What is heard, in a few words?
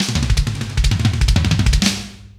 KIT; Roland - LA Composer vol. 2